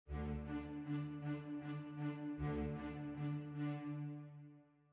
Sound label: ORCH piano; Haydn; Symph93; OutofContext